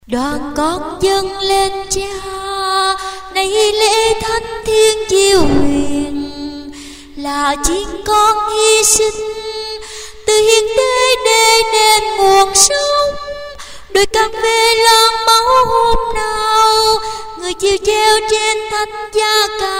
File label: Alto